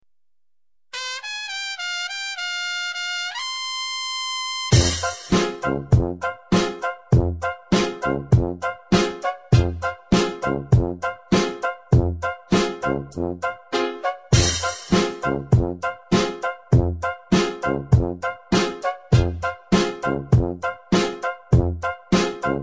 mexican hip hop beat

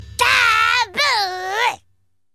baby beelbe

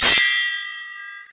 blackberry message

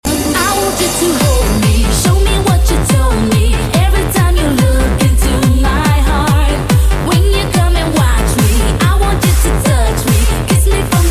bass remix

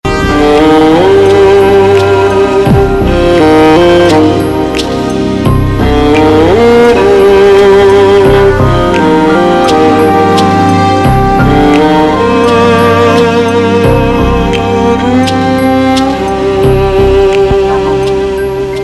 best violin theme

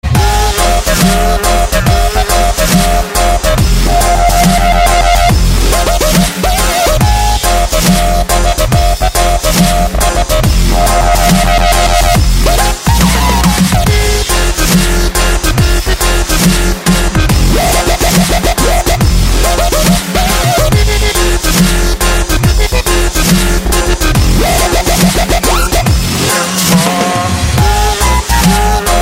dubstep

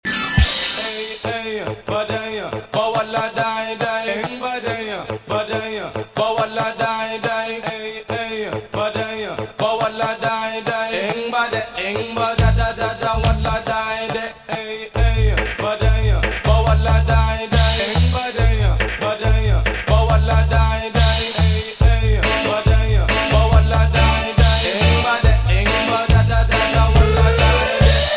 reggae

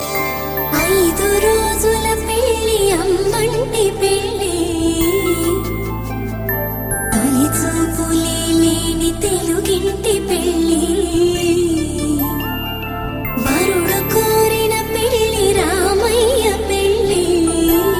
female voice; varudu

